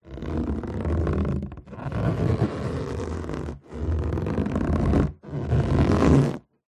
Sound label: balloon inflated handling squeak creak; zapsplat